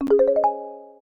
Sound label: new message